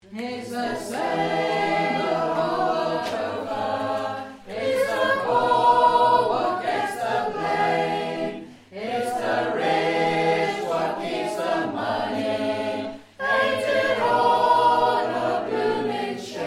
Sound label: Bass